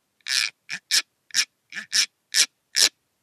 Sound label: guiro take